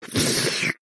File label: slurp